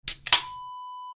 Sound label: beer can open